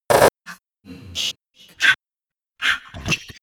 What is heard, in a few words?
verbal noises